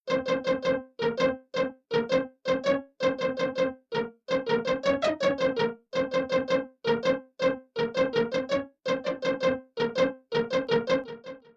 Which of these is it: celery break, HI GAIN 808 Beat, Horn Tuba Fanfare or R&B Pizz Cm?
R&B Pizz Cm